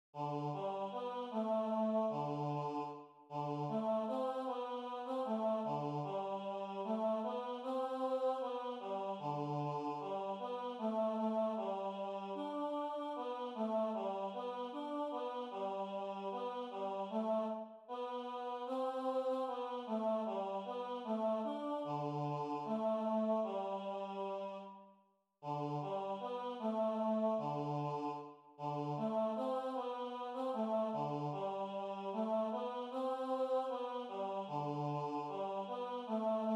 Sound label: Tenor